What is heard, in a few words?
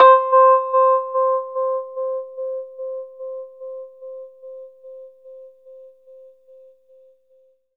RHODES OCTAVE C; 3D Sound; 3D Keys VOL-1